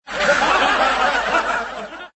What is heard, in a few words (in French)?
rire